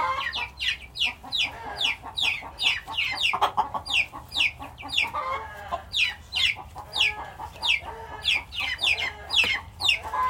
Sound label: Scared chick